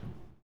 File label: STOMPER; Blues Soul; STOMPS; Spectrasonics Vocal Planet